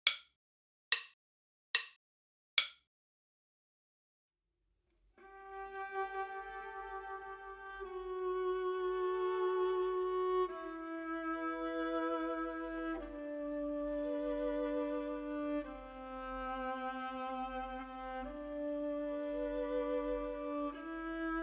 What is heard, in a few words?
minus Violin II